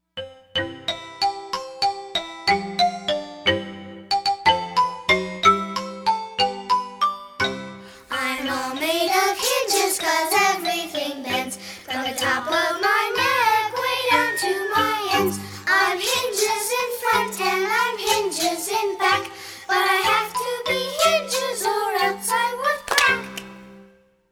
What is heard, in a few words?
words and music; childrens songbook